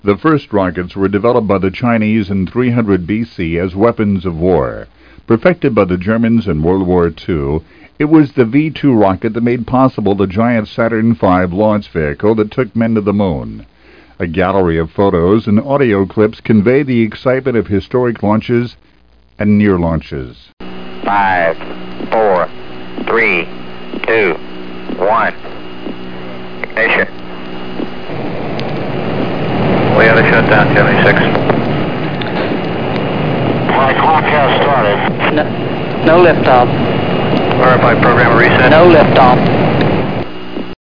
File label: LAUNCH; spaceage